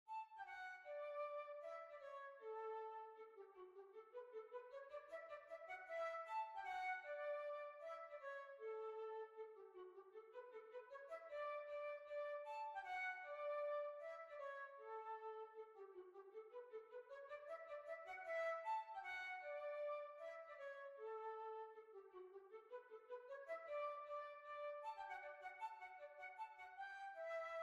Hornpipe

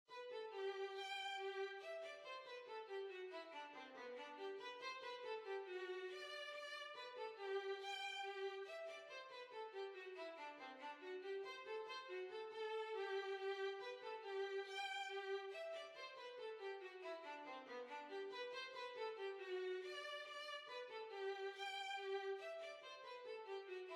Hornpipe, Violin

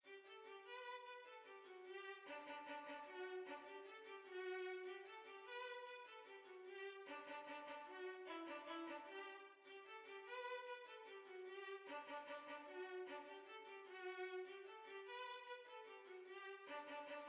Violin

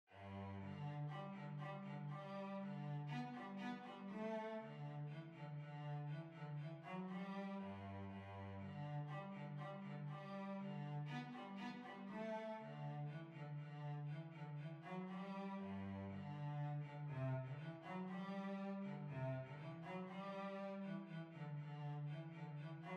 Skotsk, Violoncello

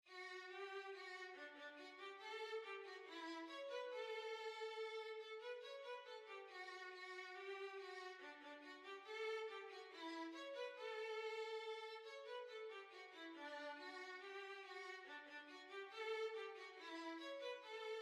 Reel, Violin

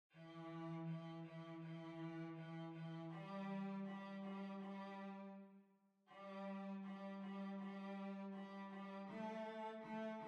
Violoncello

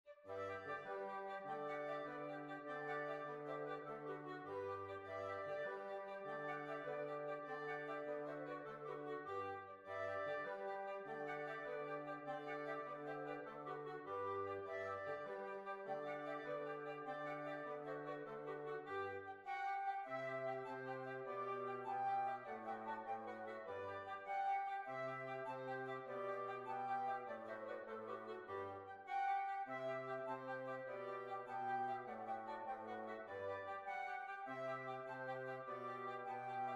Holmfirth Jig

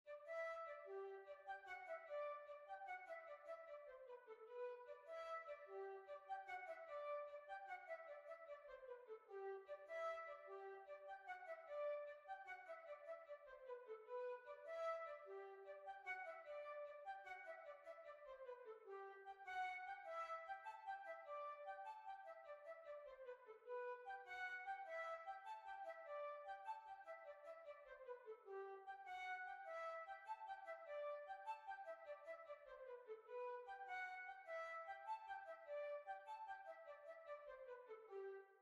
Holmfirth Jig

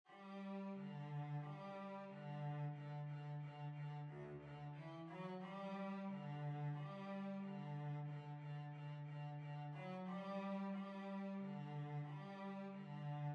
Violoncello

